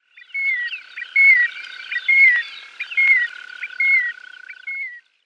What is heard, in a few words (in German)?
Teichwasserlaeufer Tringa stagnatilis, Vogelstimmen